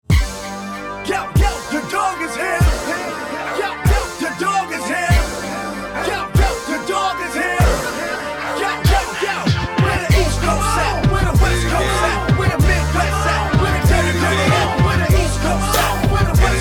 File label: Hip Hop